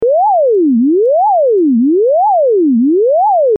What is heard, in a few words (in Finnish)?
vibraatto 2 oktaavia 01Hz